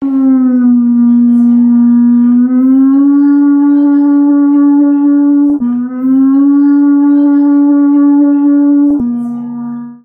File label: Pututu